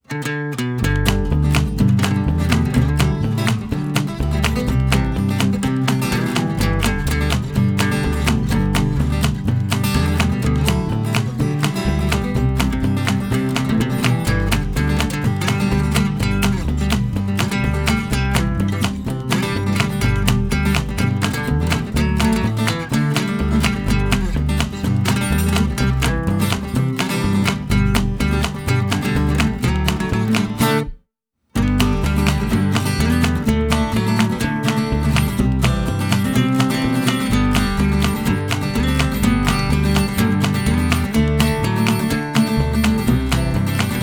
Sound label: Happy Folk